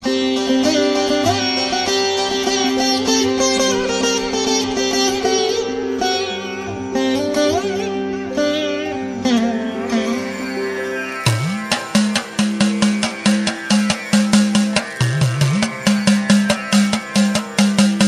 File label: Ringtone Nice